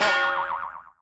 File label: Gong Boing